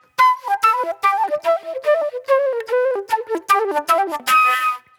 Flute

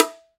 M BONGO S, World Percussion

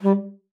STXP G3, ALTOSAX; Xsample VOL-2

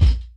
ROOM FLAT, HIP HOP; Pro-Rec - Synthsonic

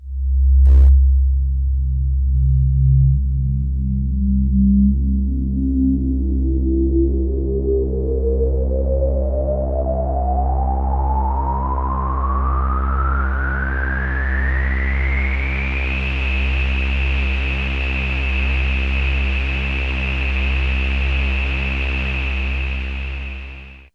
DREAM, SYNTH FX; AMG; Global Trance Mission VOL-2

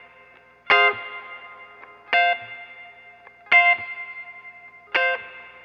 Guitar, 70s Analog Funk

